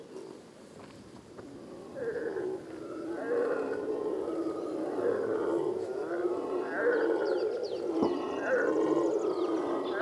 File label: black and gold howler alouatta caraya; revuna obezyany